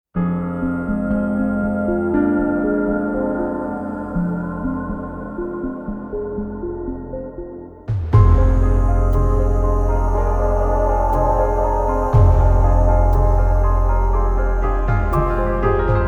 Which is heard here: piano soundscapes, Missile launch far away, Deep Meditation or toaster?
piano soundscapes